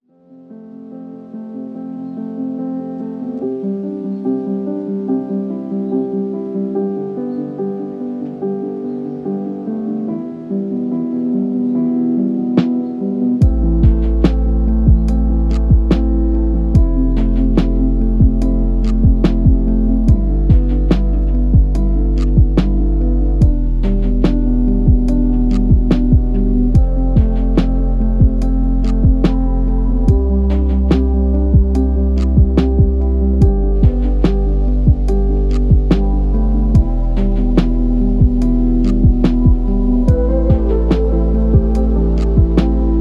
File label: Studying Lofi Beats, Calm Study Ambience